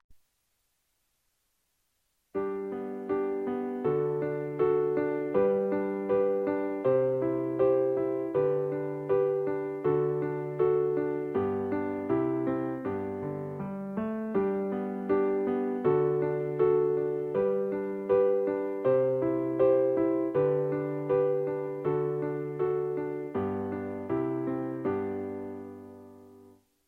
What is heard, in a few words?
tempo 80; ソ・ラ・シ・ド（普通）; 教育音楽 別冊付録